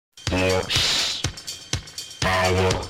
cute sms

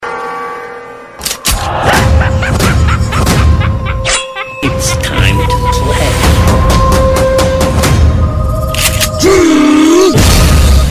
Messege tone Remix

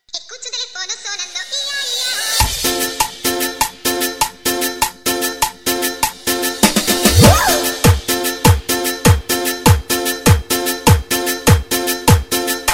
ringtone; blues

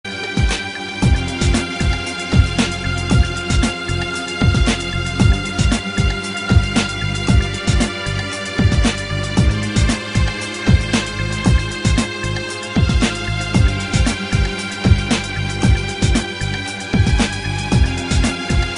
Piano Guitar

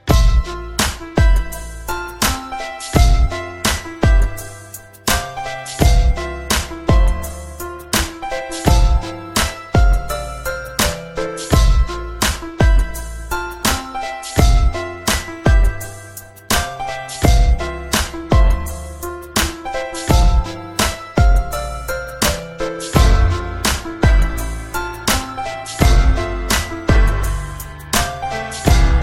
dirty instrumental

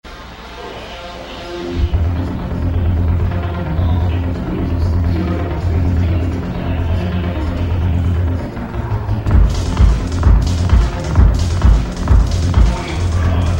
club music

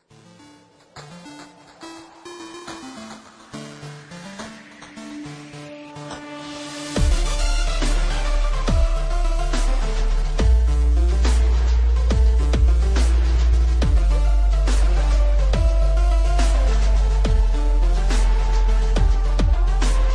dubstep mix

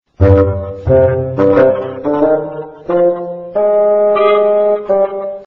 cartoon music; ringtones

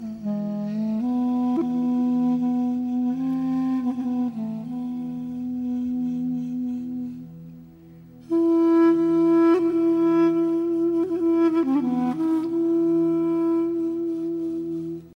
duduk sms

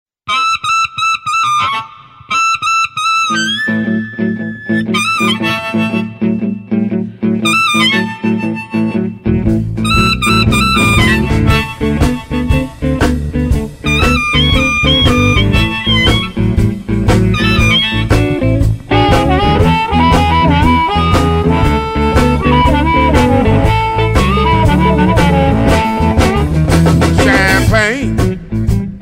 harmonica

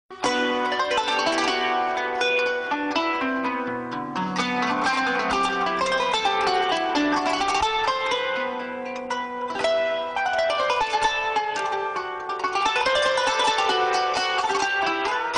kanun piano; ringtones; sms

